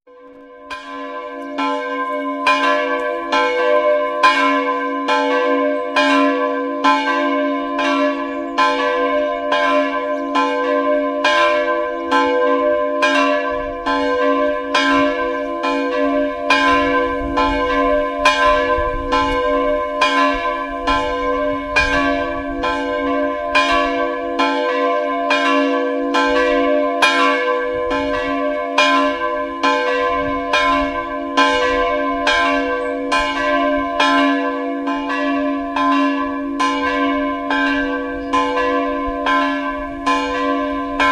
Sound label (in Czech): zvony rana evangelicky